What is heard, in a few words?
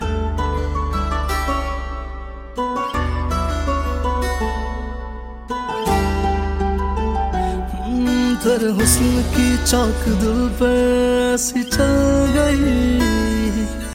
hindi music ringtone